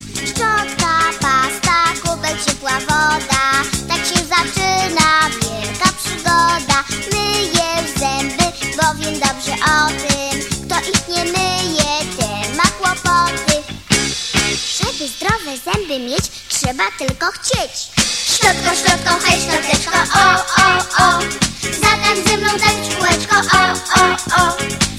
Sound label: Polish Children Song